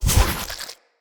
Corruption Block Cast; Characters; Enemy; Lady Deora; Abilities

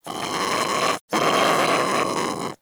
Sea Bird Vocal Snore; Characters; Warframe; Ostron; Hunting Critters

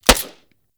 Shotgun Mech; Tenno; Alchemist

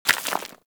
Bullet Impact Debris Rock; Warframe

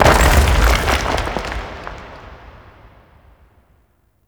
Mountain Pass Ancient Door Stop; The War Within; Oro

